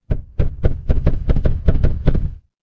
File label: mechanical engine